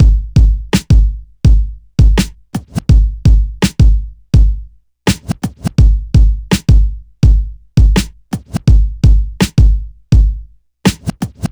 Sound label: 83 bpm drum groove g sharp key